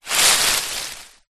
branch small dead movement forest floor dried leaves; zapsplat